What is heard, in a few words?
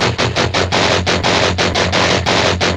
Treat Guit, Industrial Nasty